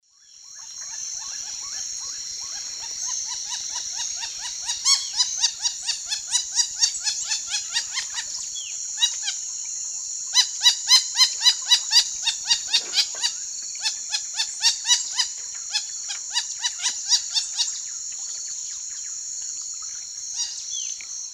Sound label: Jacana jacana, calls; S. America; Eastern Lowlands